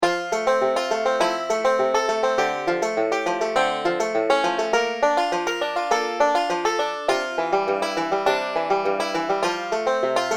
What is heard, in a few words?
chordic based pick